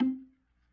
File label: B3 PERC, Vintage Keyboards